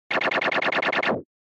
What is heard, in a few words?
SNES Shooter Shoot